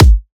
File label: Riddim Kick